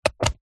mains power plug plug into extension block socket australia; household